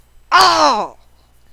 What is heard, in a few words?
scream